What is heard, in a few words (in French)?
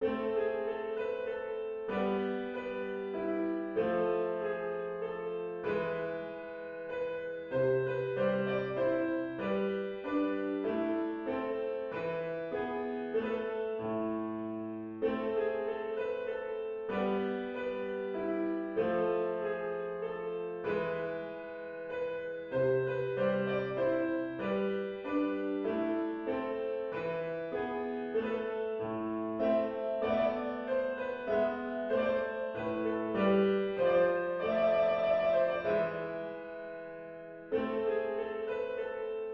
menuet mineur; purcell henry